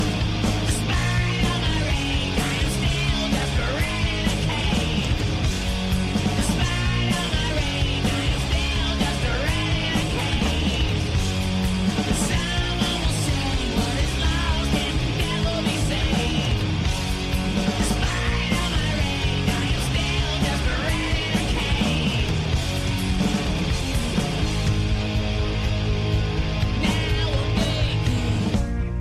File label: soundtrack trailer theme song; rampage